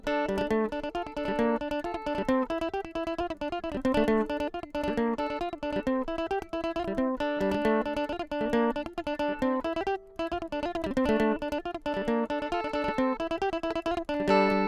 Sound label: mandolin comparision dual low melody